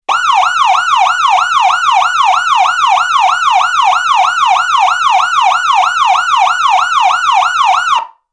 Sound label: yelp, siren; sa441M